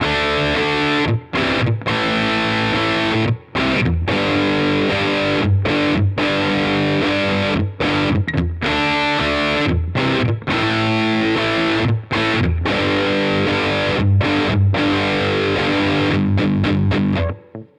Tsakalis AudioWorks Room 40 Vergleich

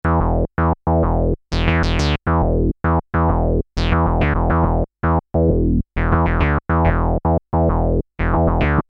basslauf

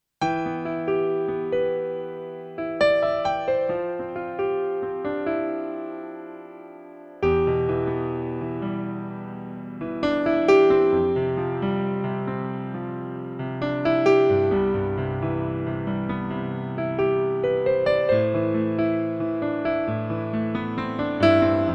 Piano mit Hall

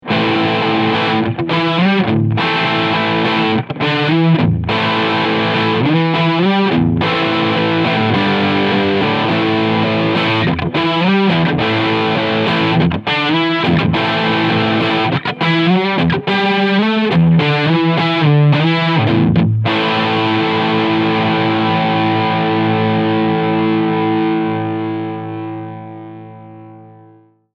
Roasted Overdrive Steg PU Stacked; Harley Benton TE 69TL Hot Rod NT

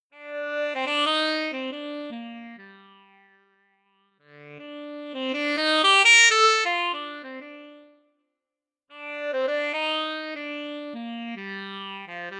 YDS-120 Tenorsax mit Phaser

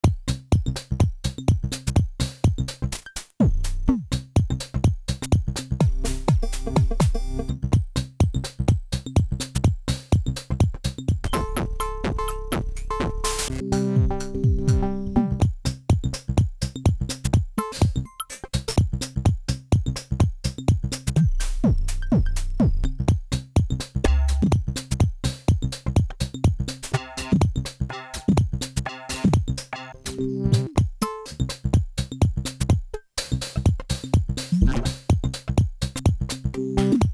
SPL Iron v2 Mix Bus Kompressor ohne SC EQ

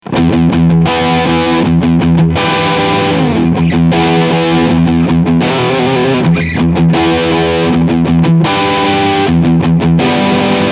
Gibson SG 61 Standard Maestro Overdrive Steg Pickup

